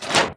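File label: crossbow reload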